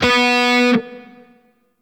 Shawzin Oct Two B; Warframe; Grineer Shawzin

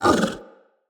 Tame Interact Bark Speak; Kubrow

